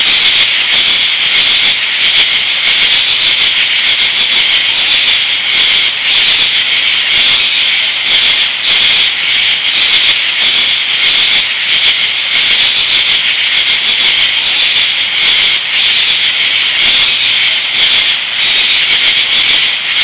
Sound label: crickets; insects; Sound Effects for Multimedia - Volume 1